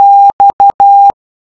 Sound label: Morse X